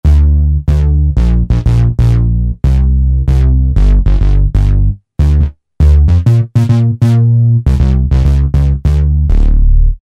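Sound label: Analog Bass, Yamaha DJX; Voices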